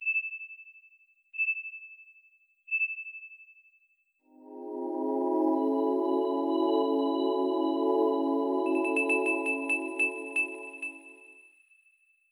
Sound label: (Jingle) System Settings - 3D Screen Check; Nintendo 3DS